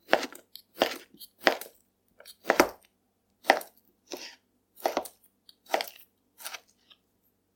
cut potato, ジャガイモ切る

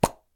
spray can cap, スプレー缶の蓋外

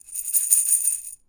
shake pill, 錠剤を振る; 錠剤を振る４

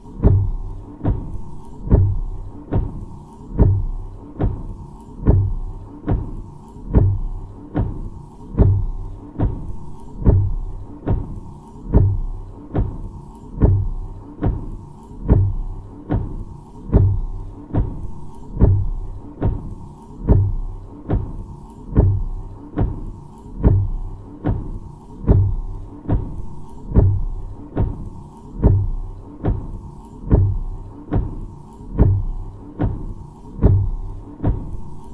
windshield wipers, car; ワイパー３

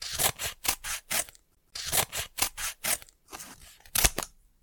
paper scissors, はさみで紙を切る; はさみで紙を切る２

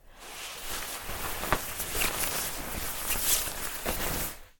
take off jumper, 上着を脱ぎ捨てる; 上着を脱ぎ捨てる１

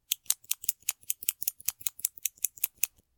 mechanical pencil, シャープペン連打

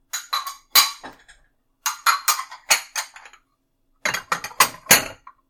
clear dishes; 食器を片付ける３

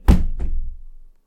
open dishwasher, cooking; 食器洗機の扉開く; 後片付け

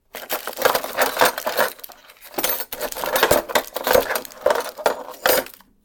search in drawer, 引き出し中探す; 引き出し中探す１

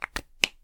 cap pen, ペンキャップはめる

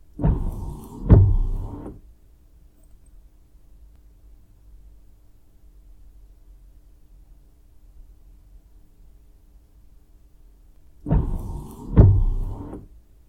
windshield wipers, car; ワイパー２

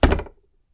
chair on wall, 折畳椅子を立掛; 折畳椅子を立掛１

